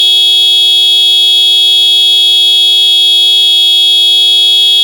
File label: disc low, horn